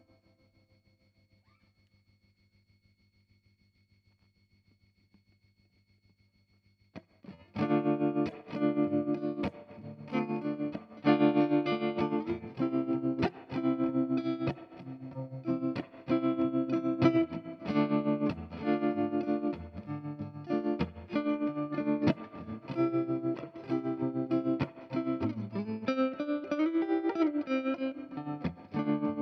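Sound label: guitar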